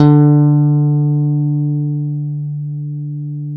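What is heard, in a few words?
GTR D1 STIC, KEYS AND GUITARS; Synclavier Sampler Library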